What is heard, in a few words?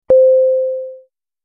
electronic chime